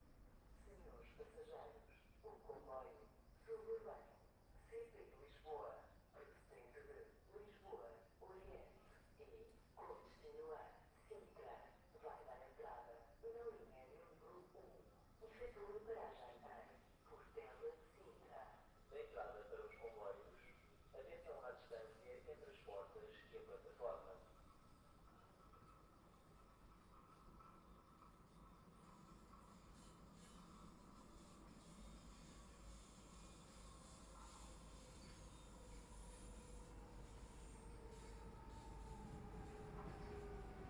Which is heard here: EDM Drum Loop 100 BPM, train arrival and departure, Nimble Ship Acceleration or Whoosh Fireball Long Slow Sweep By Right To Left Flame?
train arrival and departure